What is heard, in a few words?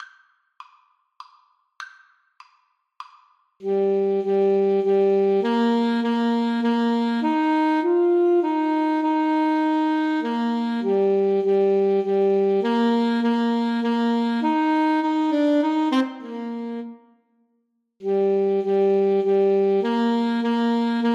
Alto Saxophone Duet